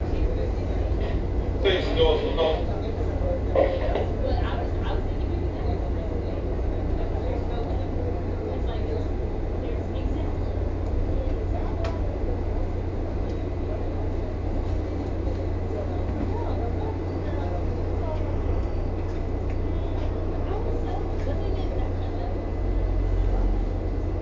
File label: Metro; field recording for sound